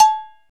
TUNED C, Cowbells; Roland L-CD701; PRC Latin 1

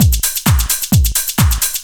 dr t 130 trance 2; LOOP TRANCE; DRUMLOOPS

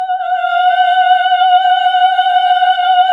HAL AAH, Nuns Aahhh

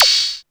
ZAP OPEN, hihat

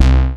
Analog Bass; Big Fish Audio; Old Skool House; OSH Bass

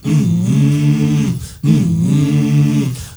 MALE, HUMMERS; Spectrasonics; Heart of Africa 2; M.HUMMERS

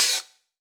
HH, Drum Mashines; HIHATS 2; EdgeSounds

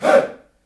"HEY", Heart of Africa, shout

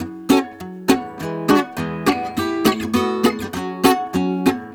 GUITAR, Balkanish Traditions; USB Soundscan vol.63; AKAI

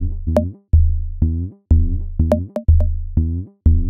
Top E 123bpm, House Drumloops; Zero-G House Fabrik Drumloops

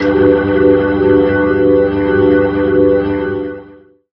Substain Organ; Zero-G Jungle Warfare 1, 2 & 3; 90 s Sample CDs

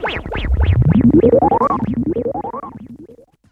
HIT, STABS, House

